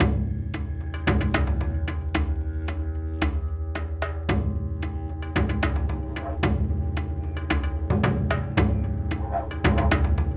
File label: Jungle; Conan; PS2